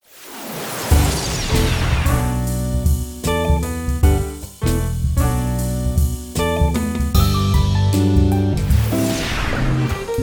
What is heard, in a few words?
jazz